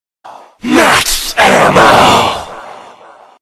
zombies max ammo sound; cod waw

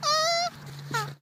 turtle pairing

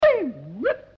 beewoop; tnt toons

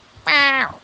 miau hardcore extended version

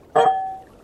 honk glass

